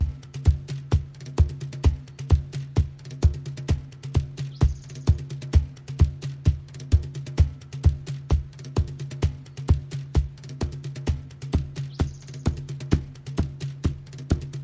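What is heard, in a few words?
Percussion Only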